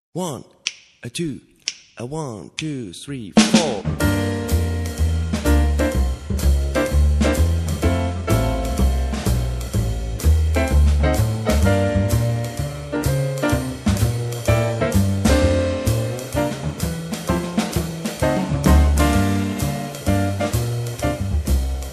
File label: jazz advanced, Playalong; Playbacks zum Improvisieren Jazz; Jazz Advanced richtet sich an fortgeschrittene Jazz-Musiker.